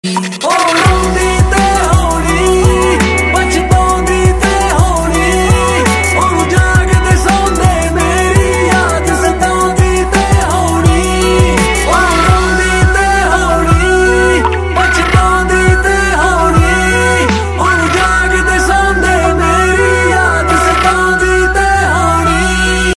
latest punjabi songs ringtone

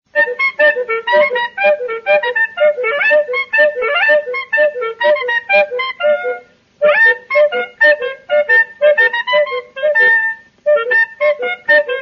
cuckoo waltz ringtone

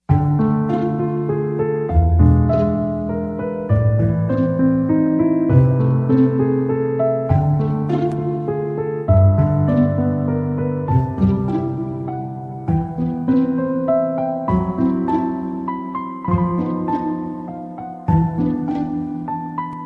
piano holiday ringtone; iphone ringtones

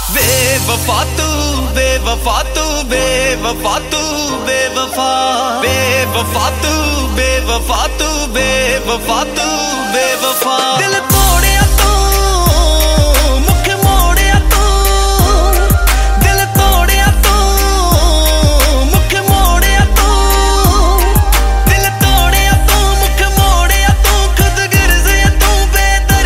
new punjabi song ringtone; bollywood ringtones

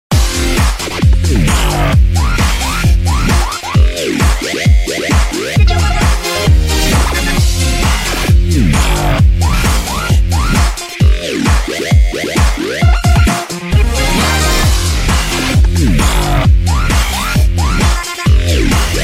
google edition s4 ringtone

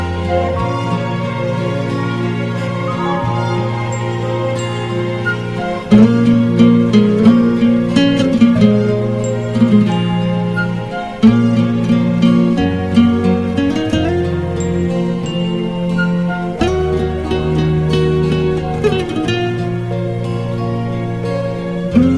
romantic bollywood music ringtone

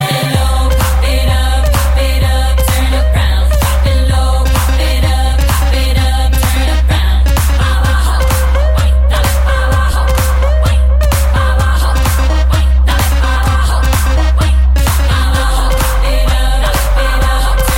rap dance song tone ringtone

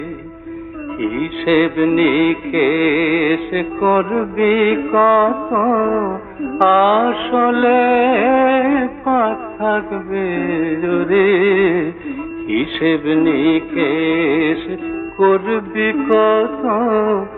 bengali ringtone

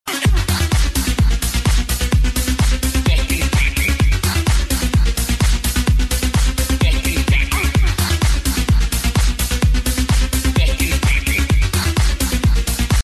trance

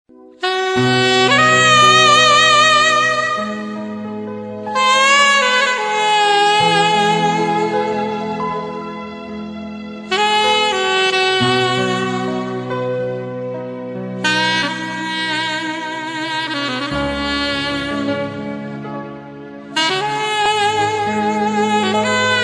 music ringtone

